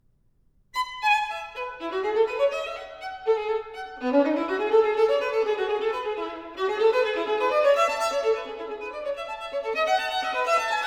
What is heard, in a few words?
Partita No.3 in E, Gigue, Solo Violin; Bach; Sonatas & Partitas for Solo Violin; BWV 1006